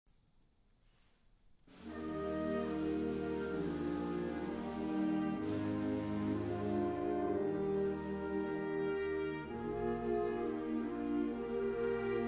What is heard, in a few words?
Wind Ensemble Chorale; L.A. Valley College; Chorale from Alto Rhapsody Op. 53